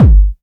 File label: tight lows kick g key